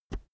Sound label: keyboard external enter push